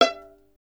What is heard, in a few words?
STR VLN, Solo Instruments; Miroslav Vitous